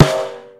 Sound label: Snare; StecMetronome